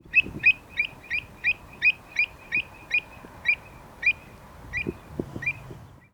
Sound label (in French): avocette elegante